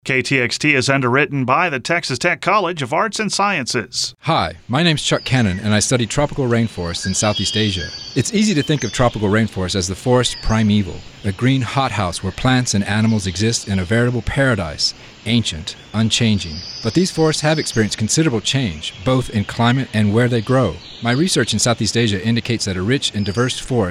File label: Tropical Rainforest